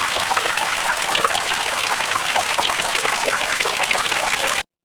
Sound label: water stream